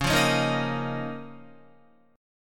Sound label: C sharp 6th Add 9th C sharp Guitar; strummed